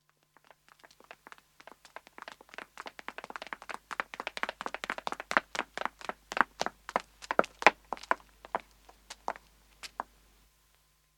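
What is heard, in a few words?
Footstep female run, 2 women approach and stop; Part of Footsteps; BBC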